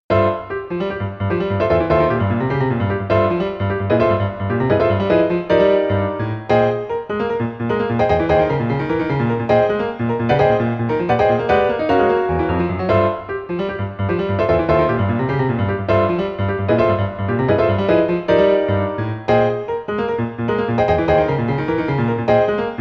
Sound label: piano; VSエキサイトバイク